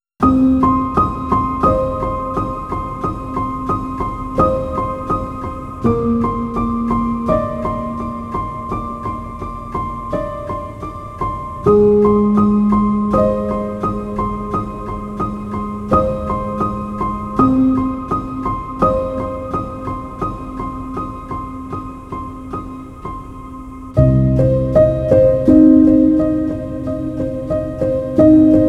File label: distress sad melancholy piano